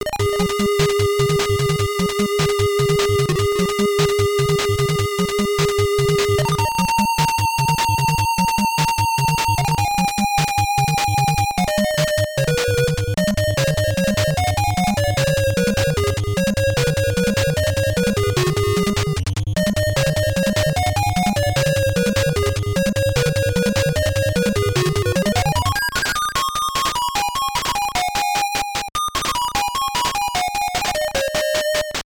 Water Flows thru Bamboo Pipe; double dragon iii the rosetta stone nes

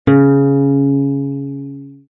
Guitar Note; Extra Lives

